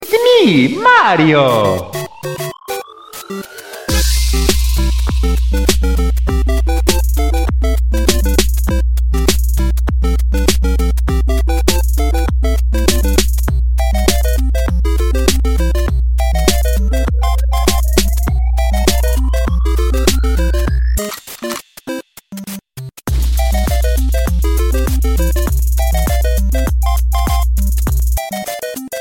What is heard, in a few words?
trap; mario bros